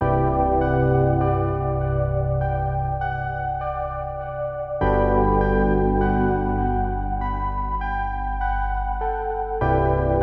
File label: 100 BPM E Min, Melodies, Ambient; Above It All